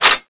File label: hammer change; knifeze